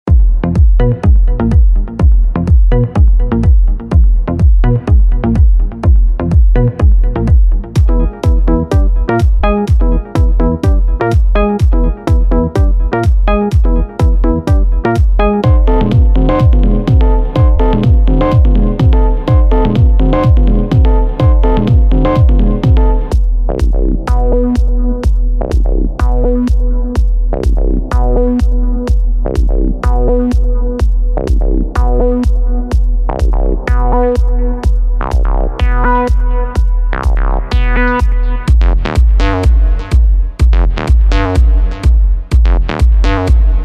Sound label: super synths